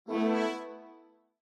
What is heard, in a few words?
Windows Battery Low; abbottabad compound